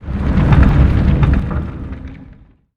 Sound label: Sfx Colossus Movement